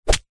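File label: spear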